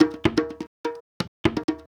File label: HOUSE BEATS 125